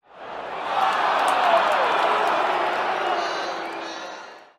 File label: boo; 2fast2curious